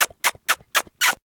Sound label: Kissing; Ed Edd n Eddy; Adromulus Pack